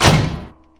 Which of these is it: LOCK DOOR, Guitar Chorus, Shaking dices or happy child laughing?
LOCK DOOR